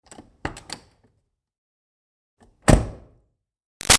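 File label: Truck Ford Pickup Door Open Close; Trucks; Vehicles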